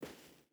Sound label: footstep barefoot sand walk; Rust